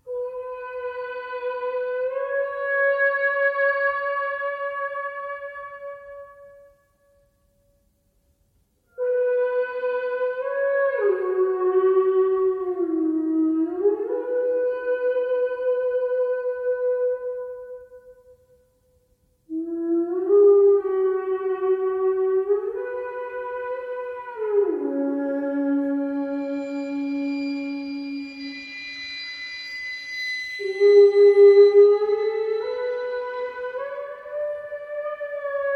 for horn and string quartet version

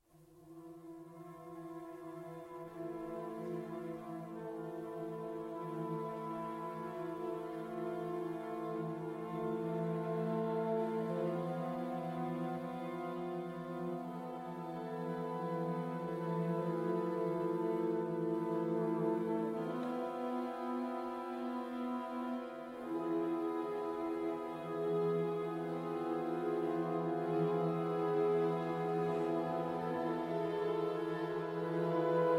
string orchestra; grues et nix

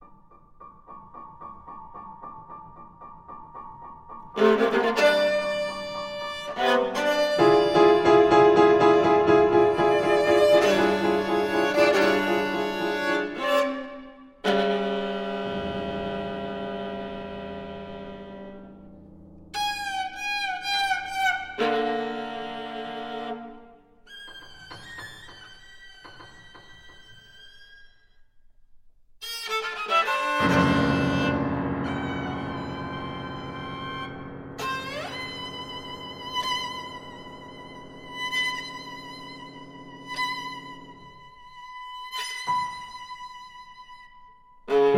sonata for viola and piano

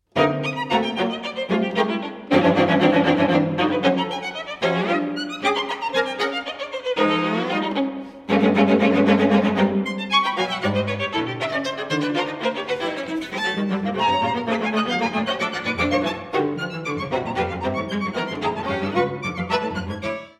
string quartet allegro

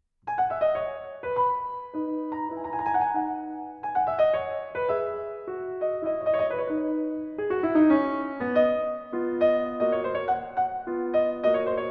sonata in b minor tempo di menuetto; hob xvi 32 ii

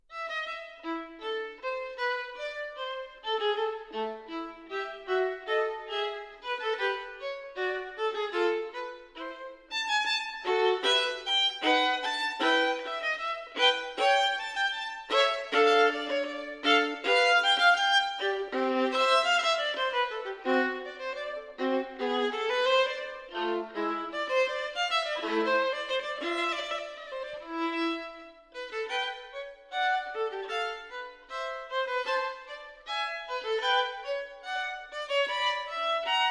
violin sonata in a minor fugue; violin sonata no. 2; bwv 1003 ii

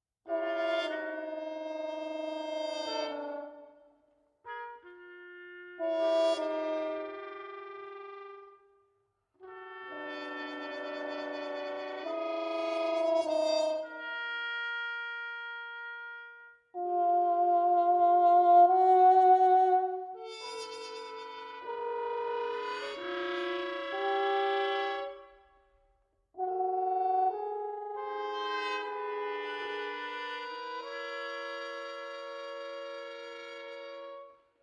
fanfanfaren adagio, horn; Andy Kozar; works for multiple trumpets

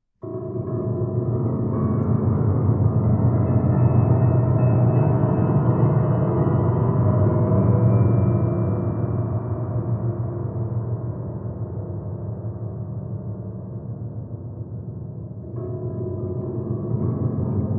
solo for piano

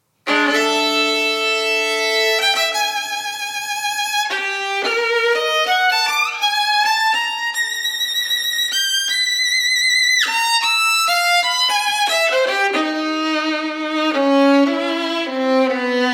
partita for solo violin improvvisazione

